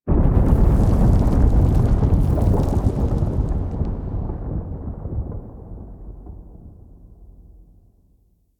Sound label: Moon Earthquake Event; Ambience; Warframe; Orokin Moon